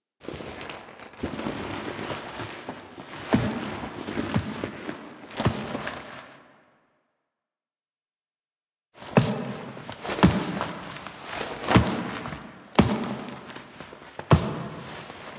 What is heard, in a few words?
Death Foley; Duviri; Teshin